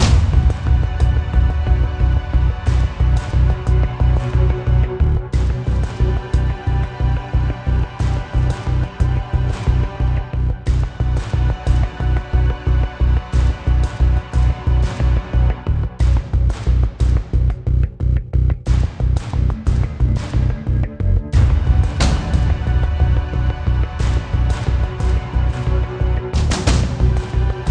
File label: Industrial Combat